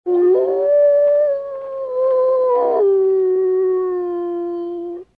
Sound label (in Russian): solo lunnyj